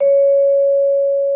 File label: VIBES C#5; Xsample VOL-4